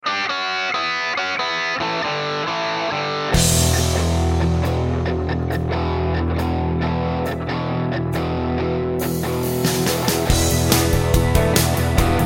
(Live) (E) No Backing Vocals

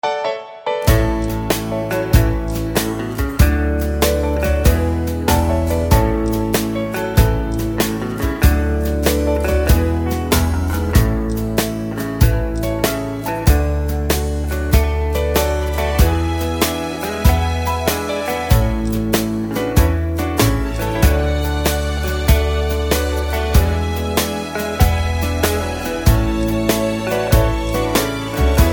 (A) No Backing Vocals

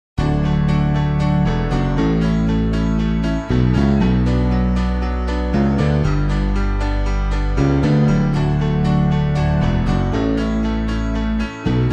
No Backing Vocals

